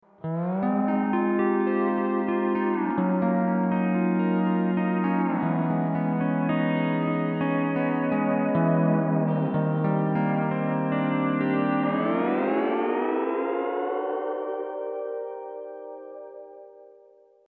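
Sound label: intro c6th with b on 2nd string, steel guitar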